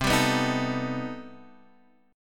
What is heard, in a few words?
C Minor Major 13th C down Guitar Drop D